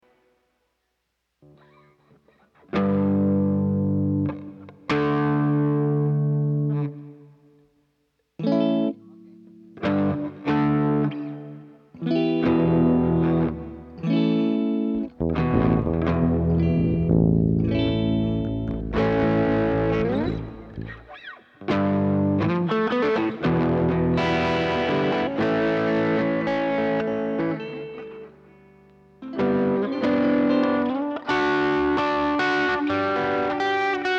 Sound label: Strings